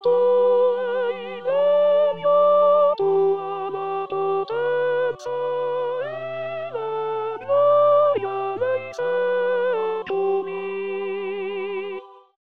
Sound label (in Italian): Tuo E il Regno Tenori